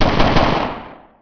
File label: light infantry; Emperor - Battle for Dune